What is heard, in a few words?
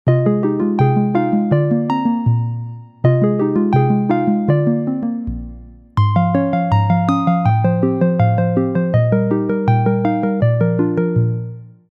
kettle, apple iphone zil sesleri